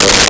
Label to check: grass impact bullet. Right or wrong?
Wrong